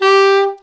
sax; Winds

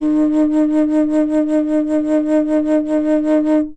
flute; Winds

